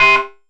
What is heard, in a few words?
SYN, 160 BPM; Ueberschall; Drum & Bass Flash Trackz